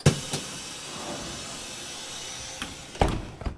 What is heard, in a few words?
bus close door, street traffic; best service Blue Box